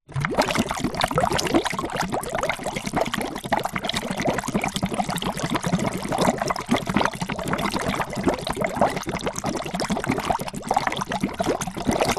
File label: nature water bubbles bubbling in plastic pot